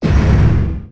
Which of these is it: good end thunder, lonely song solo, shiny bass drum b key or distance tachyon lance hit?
distance tachyon lance hit